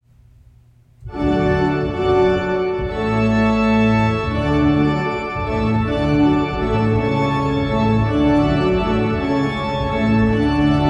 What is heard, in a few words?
Hornpipe; Water Music; Handel; Recessional